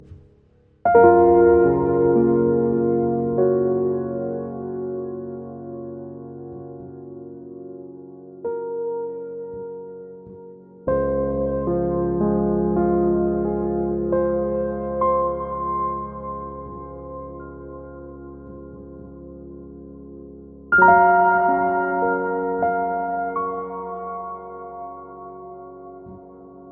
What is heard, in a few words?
Ethereal Piano